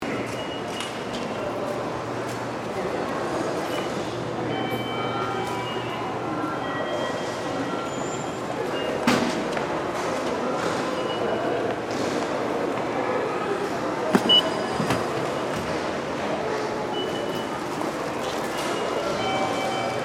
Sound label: station ticket gate